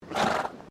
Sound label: ANIMAL DONKEY SNORT, Domestic Donkey; Animals